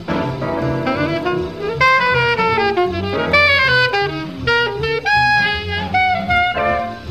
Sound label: Line slow